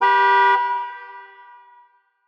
car honk distant; ambient

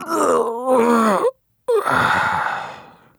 death; Counter Strike; CS:GO; phoenix coop

